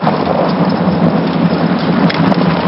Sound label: gravel